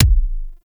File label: Pistol Punch Alt, weapons; Warframe; Thanotech